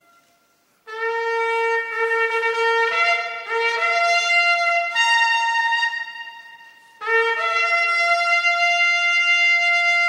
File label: Shofar